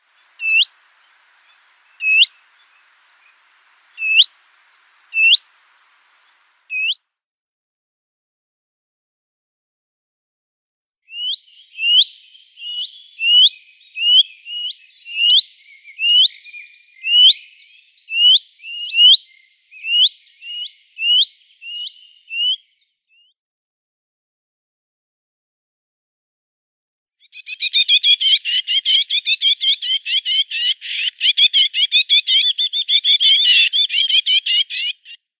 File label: Willow Warbler calls